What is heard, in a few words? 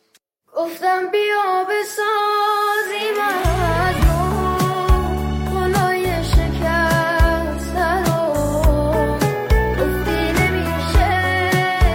music sad; آهنگ غمگین گریه آور مخصوص اینستا